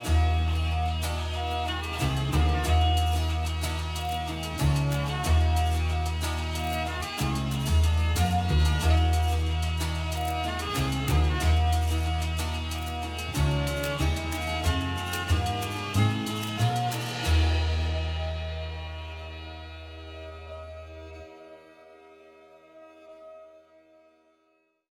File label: Low Key Positive Track; Crusader Kings III